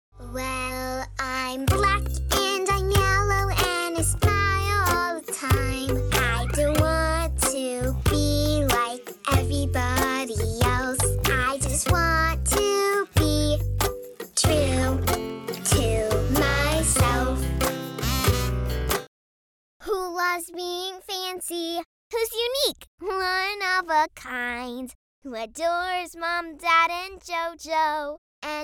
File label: kids singing